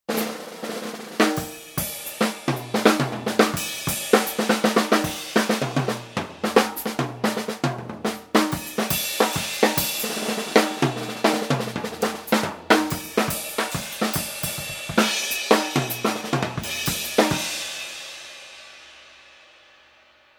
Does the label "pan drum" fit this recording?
Yes